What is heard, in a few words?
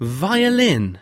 violin